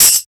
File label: Shaker